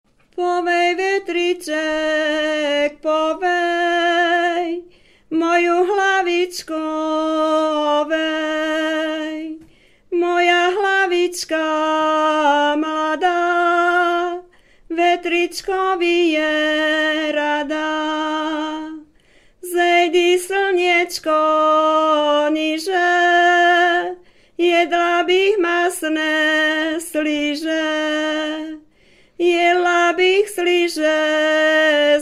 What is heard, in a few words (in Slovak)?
folklor